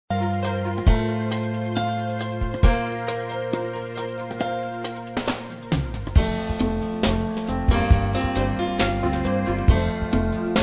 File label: Fall Music; on hold message music